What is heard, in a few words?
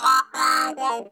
CARTOON, Funk Guitar; Zero G - Funk Guitar